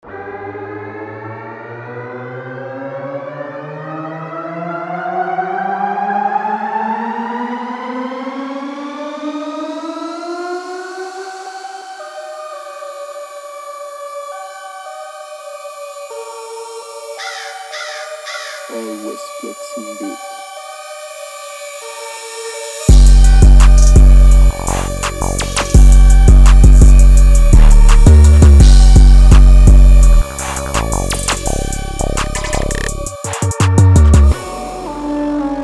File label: 168bpm; F; trap